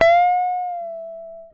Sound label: GTR TRIK, Jazzistic; Univers Sons